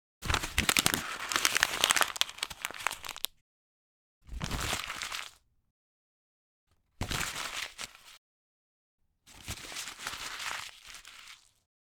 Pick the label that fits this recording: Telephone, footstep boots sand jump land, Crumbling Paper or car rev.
Crumbling Paper